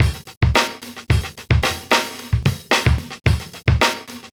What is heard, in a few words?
live beat; Sonic Foundry (Sony Creative Software); Ugly Remnants VOL-2